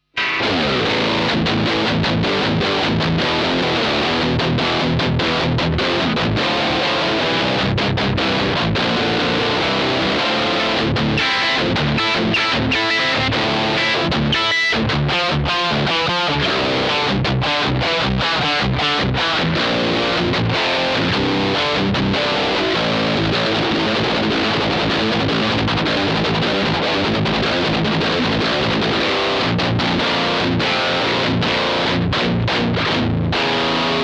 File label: MXR EVH 5150 overdrive test